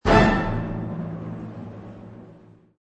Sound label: Orch Hit